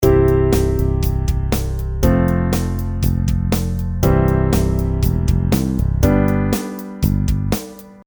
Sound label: Added 1st Inversion C